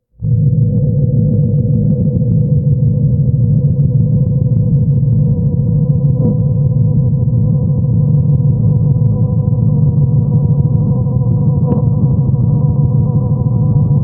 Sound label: Electronic Screams